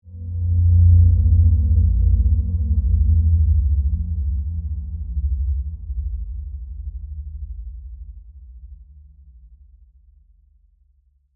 Magnetic Ray Beam, Scifi, Magnetic, Ray, Beam, Heavy, Science, Fiction; Battle; Blast Wave